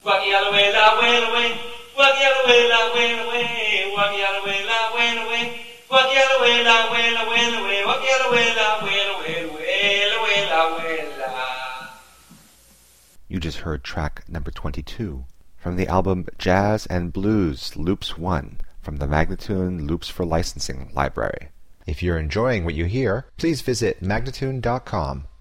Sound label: G, spoken, Jazz and Blues Loops; Loops For Licensing; MagnaLoops